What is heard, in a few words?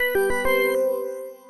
charge complete; ZTE; E850